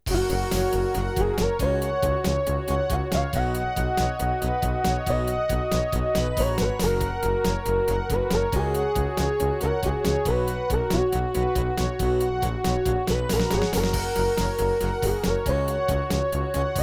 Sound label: MT-32; 4D Sports Boxing (Mindscape); Hall of Fame